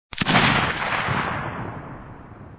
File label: gunshot; teamcity